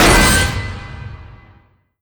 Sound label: intro vent smash; scripted sequences; reviver